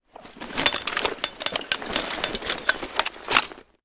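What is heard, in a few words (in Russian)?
оперативные сборы автомата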